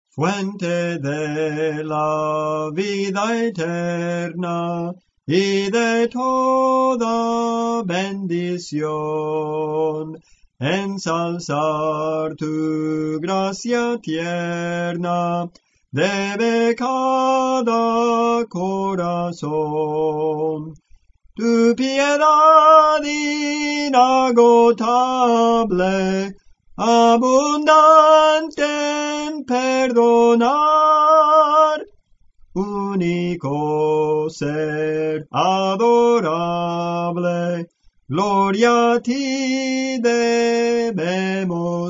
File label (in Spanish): Soprano, Cantos Espirituales; Soprano (melodía)